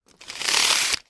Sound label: industrial tool tape measure retract short length